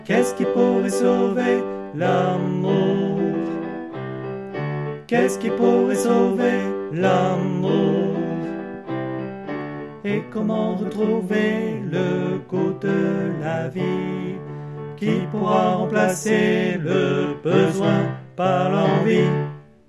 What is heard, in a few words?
Refrain Tutti